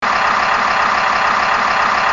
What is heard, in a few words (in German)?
U4000 außen getunt, Unimog